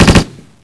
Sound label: hmg 3 rounds, weapons; hl2